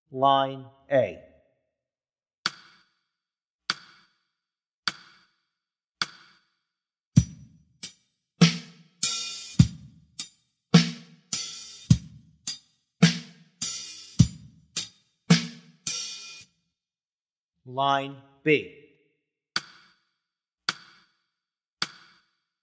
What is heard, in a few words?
Drum Beat Exercise